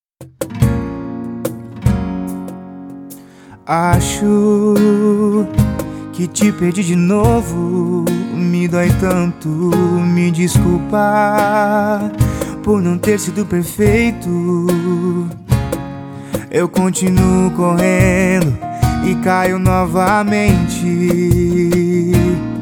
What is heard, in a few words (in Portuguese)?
sertanejo sofrência